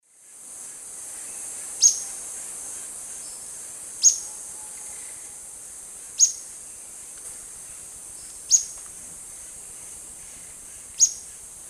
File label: Xenops minutus, call; S. America; Eastern Lowlands